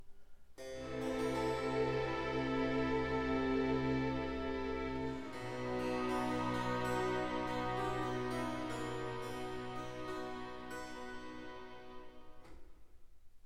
Brandenburg Concerto No. 3, In G Major, Bwv 1048, Adagio